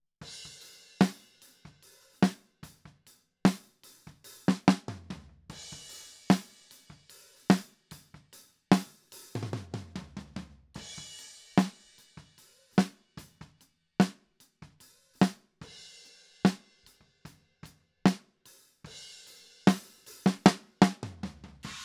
Snare Top

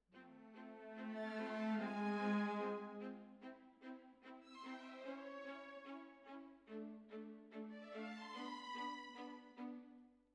Cellos Over

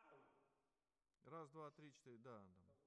Alto, RNR for piano

